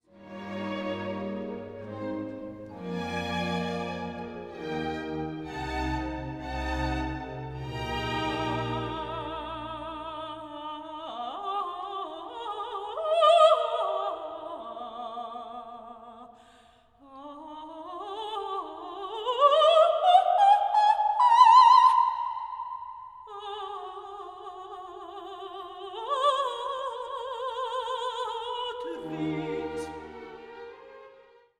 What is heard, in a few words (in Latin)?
Gratias cadenza